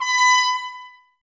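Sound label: brass trumpets B; Miroslav Vitous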